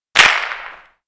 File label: Inf Weapons; Red Orchestra 41-45